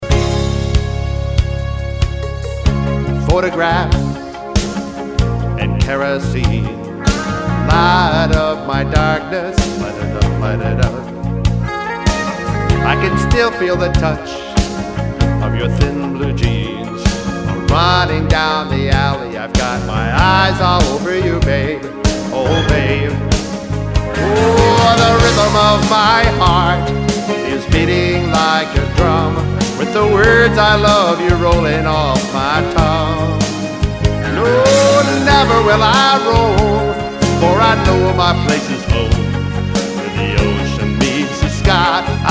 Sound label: Rhythm